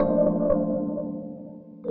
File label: rhodes 125 F, rhodes licks; house; PS-28 Vocal House Samples; instrumentloops